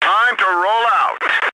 start

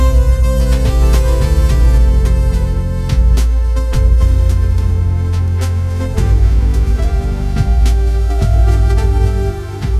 bella ciao guitar

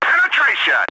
hit

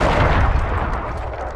death; tankwar

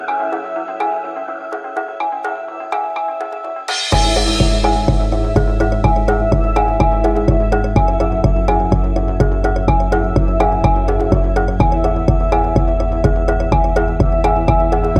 electro

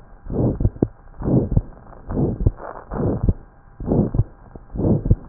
murmur; HeartbeatAnomalies Classification

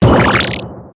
Costume Change; Arcade; Taiko no Tatsujin 2020 Version; Common Sound Effects

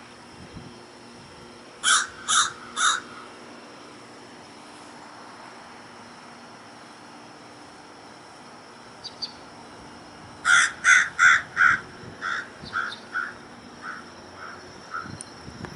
Louisiana Waterthrush, Parkesia motacilla; Aves

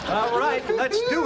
excitement

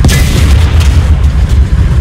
fire; TankWar3D

